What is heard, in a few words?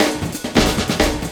LOOP SD, 180 BPM; Ueberschall; Drum & Bass Flash Trackz